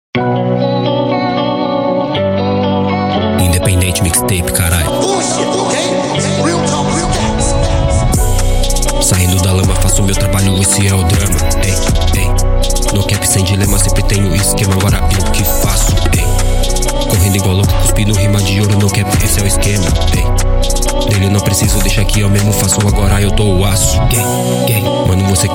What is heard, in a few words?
no cap beat